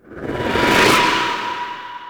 Queen Blast Charge; Warframe; The War Within

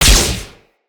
Flak Explosion Small; Eximus; Blitz

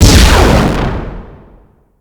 Buff Burst; Syndicates; Steel Meridian

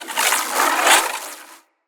Casting Device Projectile Whizz By; Weapons; Player

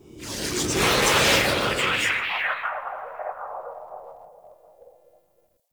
Temple Assassins Spawn; Ambience; Warframe; Orokin Derelict; Red Veil Temple

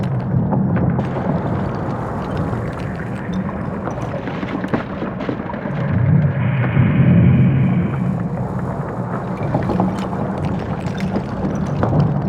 Exterior Damaged Loop; Ambience; Gameplay; Warframe; Corpus Railjack; Comet Shredder

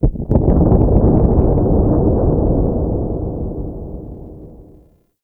Distant Asteroid Impact; Ambience; Warframe; Grineer; Fortress

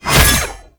Bayonet Melee Combo; Warframe; Tenno

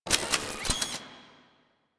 Alt Mech, Blastor Pistol; Warframe; Weapons; Corpus; Plinx

